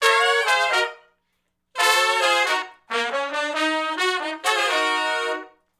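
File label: R&B Riff (Eb) harmony, Horncraft; Sony Creative Software; Crimson Blue and Fabulous Horncraft 4 RnB; Horncraft for R&B; Sections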